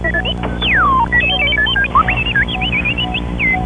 Bazzoca; PobreJoaquim

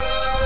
glide synth

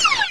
shot; aggress

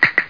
KNUCKLE

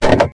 met strk, bugs; Starship Troopers

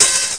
Open Hi Hat

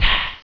JUMP JUMP

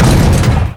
Crushing Metal; 1944 Battle Of The Bulge

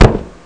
Thump; Dabbler

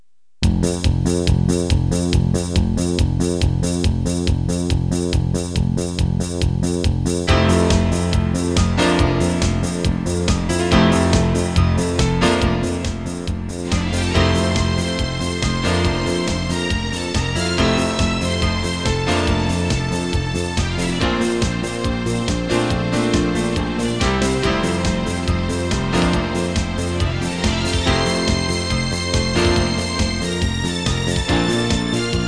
disco; MIDI Master Collection 2; dance

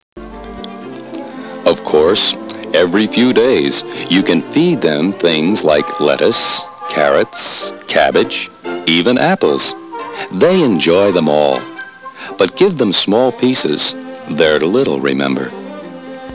gerbile; Hobby Corner: Nature Hobbies

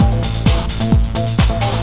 trance mix

